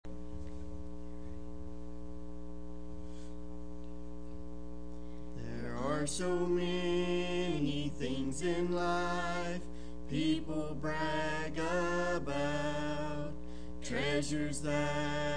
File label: singing Family